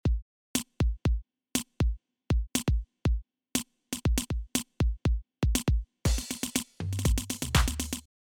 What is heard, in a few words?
Cabbage Snare in Cap Beat Loop